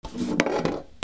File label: GARAGE